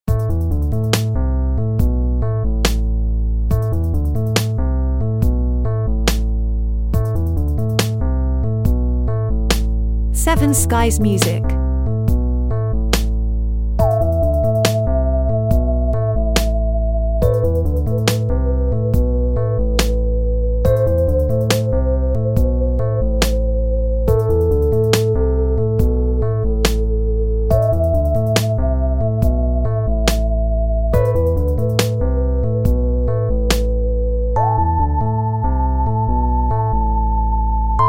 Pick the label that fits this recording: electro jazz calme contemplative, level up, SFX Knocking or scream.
electro jazz calme contemplative